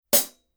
16 hi-hat closed; paiste; giant beat

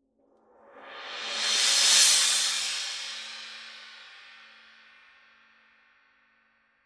paiste pst x 18 swiss thin crash roll

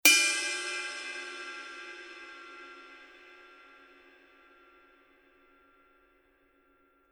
giant beat 26 giant beat bell, paiste

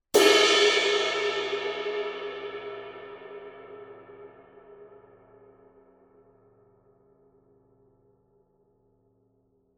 22 dry ride edge; paiste; masters

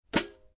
14 black heavy hi-hat chick, paiste; color sound 900

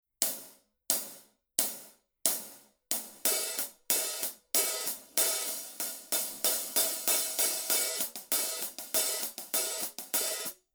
15 red heavy hi-hat pattern, paiste; color sound 900

